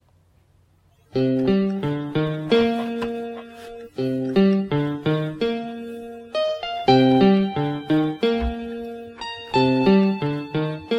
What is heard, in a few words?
piano